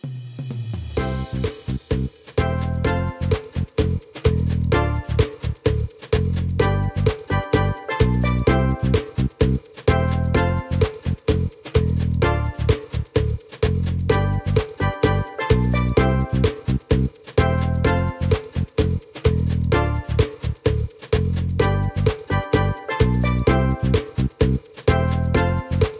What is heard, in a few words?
Patter